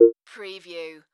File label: Multimedia Beep